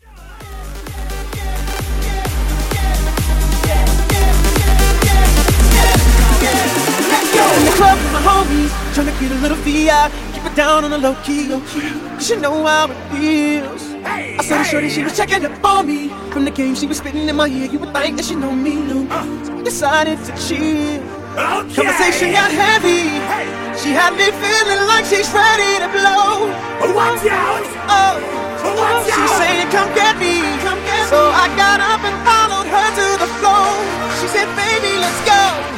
EDM; Vip Mashup

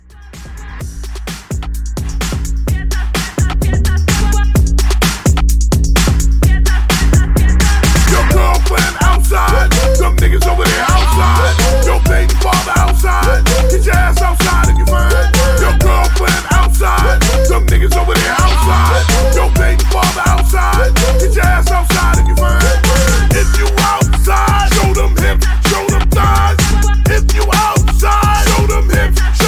Re Drum 128

